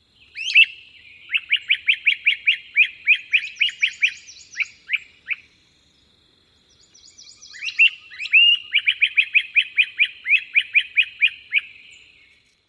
outbursts

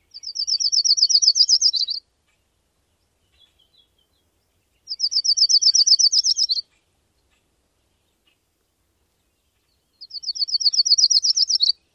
Myrtle song

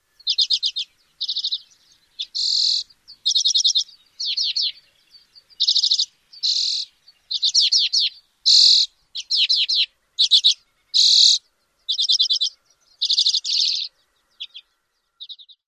song; AK

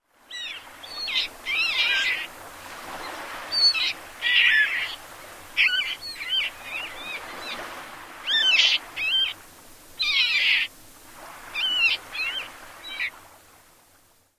more calls

